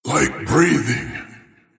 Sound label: attack pain; Dota 2; void spirit